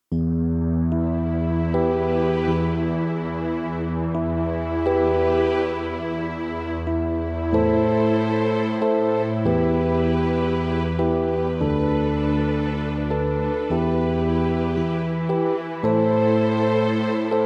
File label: piano; MegaEpix Enormous